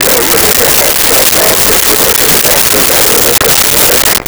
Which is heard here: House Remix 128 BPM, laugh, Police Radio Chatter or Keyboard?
Police Radio Chatter